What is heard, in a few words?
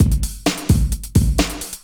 drum 130 electro, drumloops; Techno Trance Essentials